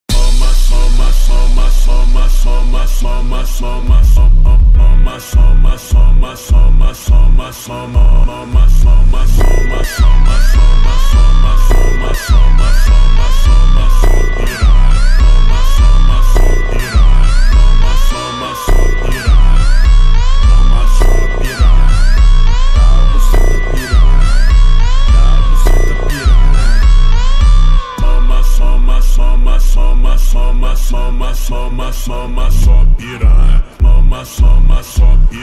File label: Super slowed phonk